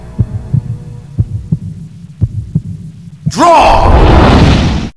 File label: revolver